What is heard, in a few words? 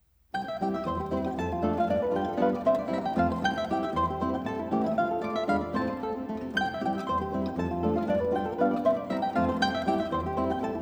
Waltz for piano, 4 hands in C sharp major